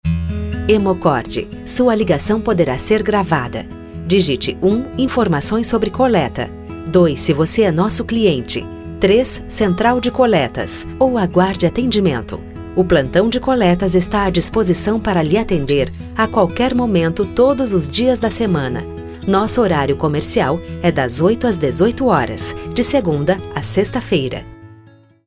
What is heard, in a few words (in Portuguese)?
Espera; Ura Hemocord